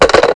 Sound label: phone, ambience; blackops